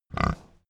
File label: pig grunt